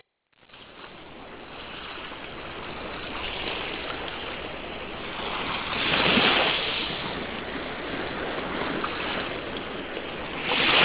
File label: waves; Sensorium; kawasaki